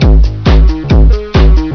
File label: bam bam bam